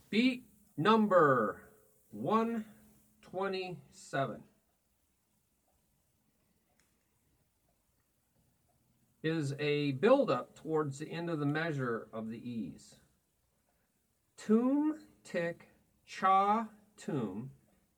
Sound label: Beat 1 2 3e 4e Toom Ti Cha Ti TiBaTi ChaBaTi